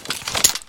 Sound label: flip draw, weapons, knife; csgoknife